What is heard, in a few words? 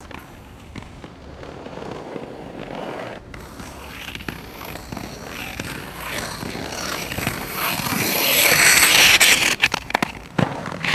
inline skates rolling and stopping